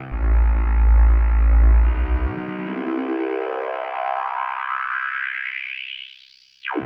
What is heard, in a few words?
TEKNO 140, Techno; Techno2; Sphere